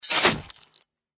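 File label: deathclaw claw attack; npc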